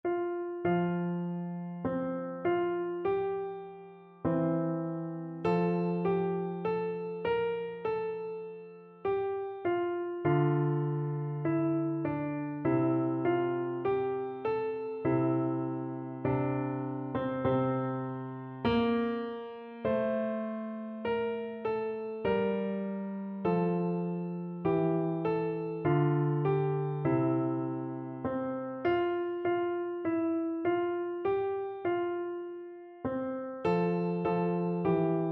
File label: o come piano